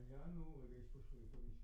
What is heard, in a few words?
C Bass